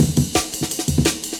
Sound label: Amen